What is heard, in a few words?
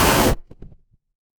retro game explosion